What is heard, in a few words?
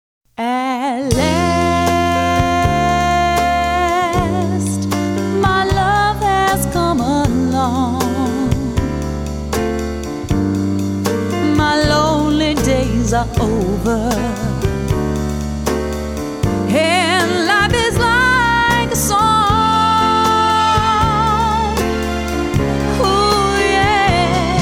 Variety Band